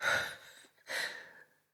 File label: Downed Breathe; Enemy; Lady Deora; Battle Barks